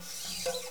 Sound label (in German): socke her; SOCKEN